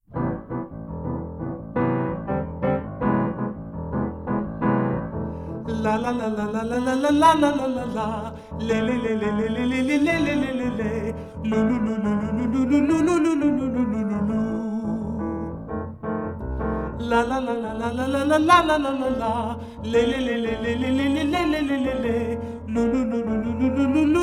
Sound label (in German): Warm up tief; Einsingen tiefe Stimmen; Stimmbildung